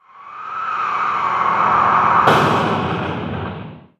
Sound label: car crash; Transport